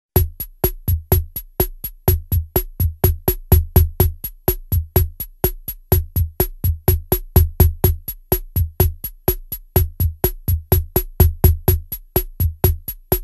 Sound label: RHYTHM DISCO